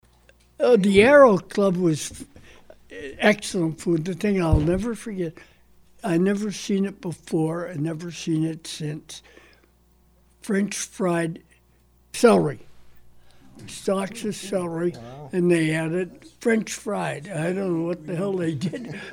Harp

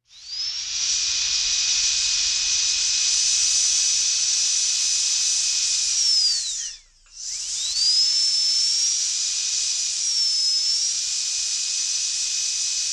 Dentist Water Drill

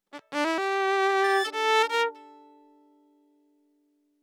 Violin

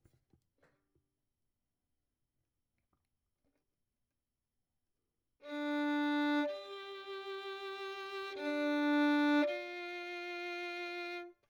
Violin; Verdigris Music

